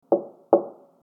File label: knock